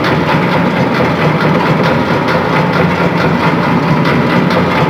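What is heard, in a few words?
Shipyards Distant Machine Loop, Ambience; Warframe; Grineer Shipyards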